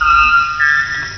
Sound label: gong; gonge